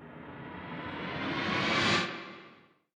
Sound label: ui rewards unlock pre glow